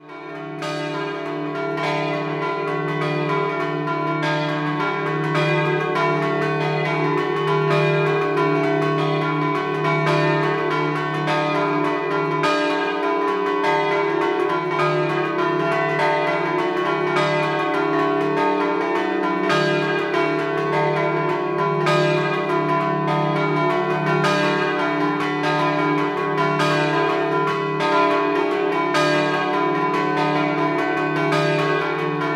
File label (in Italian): Scampanottata; campane; Carpacco